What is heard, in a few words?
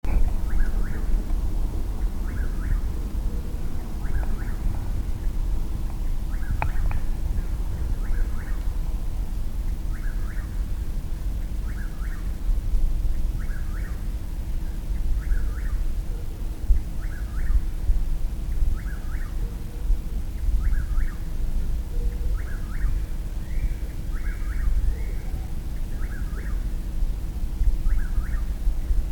Caprimulgus carolinensis; Chuck-will's-widow; MI-BerrienCenter; quiet recording; Michigan